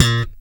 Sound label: 6 STR FUNK; Spectrasonics; Bass Legends